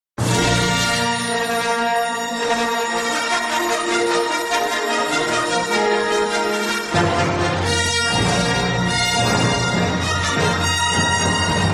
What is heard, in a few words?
intro music; star wars